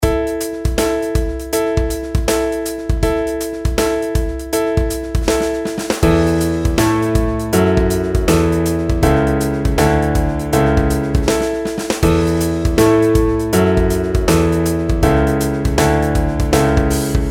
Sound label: pitch patterns skipwise